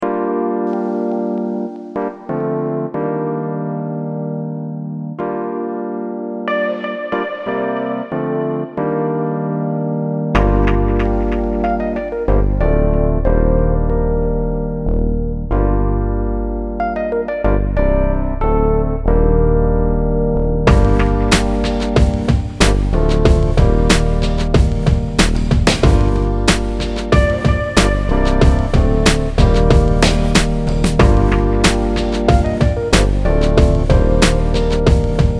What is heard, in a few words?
Chillout Hip Hop